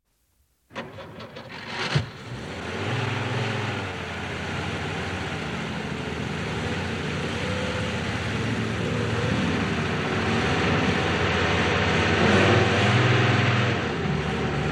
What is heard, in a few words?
3D car; 3D звук , слушать только в наушниках (иначе эффекта ни будет ) , желательно в компьютерных